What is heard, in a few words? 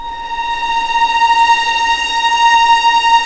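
A#4 legato violin, left, The Ultimate String Collection; East West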